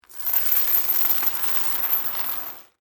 Chain Drag Wood, Industry, Chain, Drag, Across, Wood, Surface; Factories; BlastWave